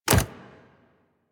Step Default, Foley Footsteps, Pets; Warframe; Zanuka

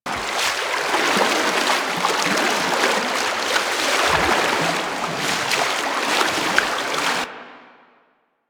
Turbine Water Loop; ambience; props; loops; Warframe; Venus Open World; Venus Solaris United

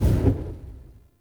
Invis Rune Smoke Melee Sweep; Powers

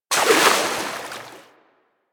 Water Sprayer Shot; Warframe

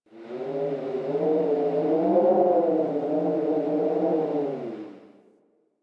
Landscape Cave Wind Howl, Ambience; One Offs; Warframe; Venus Open World

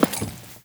Walk Metal, Footsteps; Enemy; Lady Deora

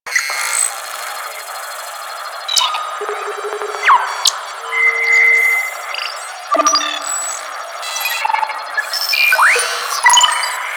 Scan Pillar Loop; DE; Warframe; Frumentarius; Scan Ability

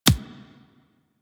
Bullet Impact Hit Notification; Warframe; Weapons

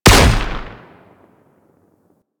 Anti Aircraft Gun 3D Shot; Weapons; Warframe; Archwing

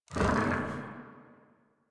Infested Charger Idle, Enemies; Warframe

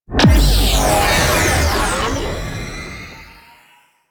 Space Fighter Split Ability Start; Warframe; Enemy Ships; Space Fighter Sentient Coil Agent

